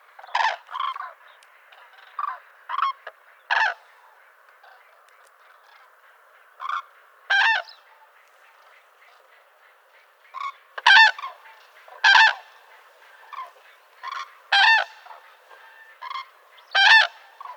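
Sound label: call, Grus grus, Crane, Note the calls of the far Cranes on ground. Background: Green woodpecker and flapping wing of the flying Crane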